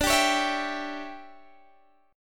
D Major 7th Suspended 2nd Suspended 4th Guitar Standard, chord; strummed